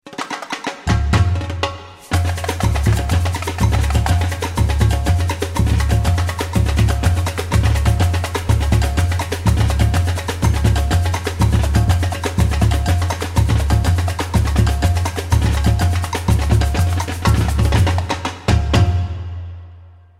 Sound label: Samba Enredo Short w Reverb